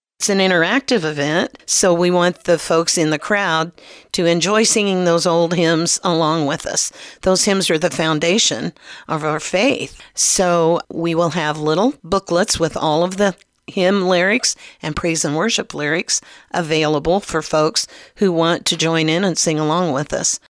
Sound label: Hymn Sing